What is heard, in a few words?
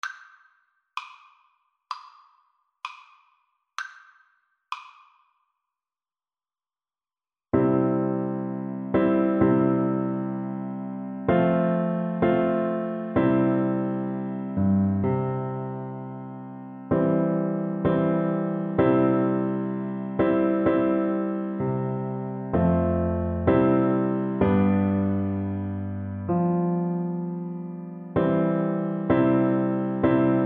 kumbayah FL kar, Flute